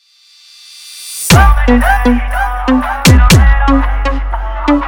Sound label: Hard Kick Mix; Tom And Jerry